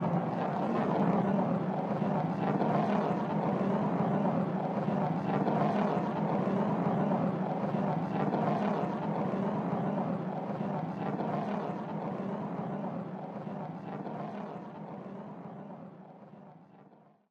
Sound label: Player Hand Roll Fast